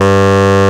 FM TENOR G2, HORNS; AKAI S-Series CD-ROM Sound Library VOL-2

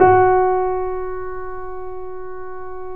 F#, The Piano; InVision Interactive - The Piano; BOESINGSOFT

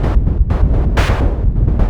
CANNONADE, arpeggios; ILIO; Trance Fusion

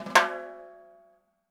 DW Green Maple Timbali

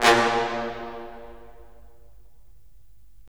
BRS, Roland LA Composer

